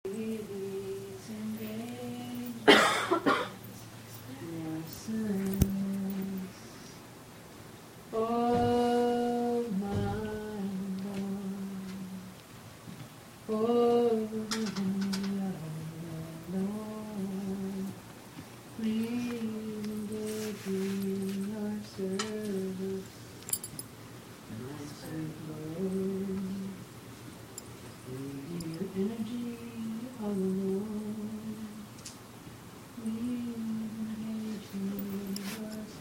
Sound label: spontaneous singing; Please Engage Me in Your Service; Melbourne